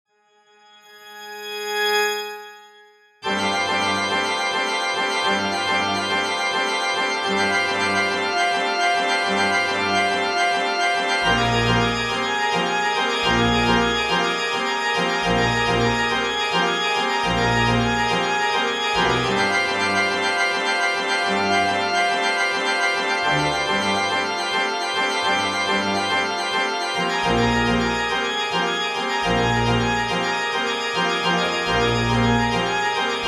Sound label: Organ Postlude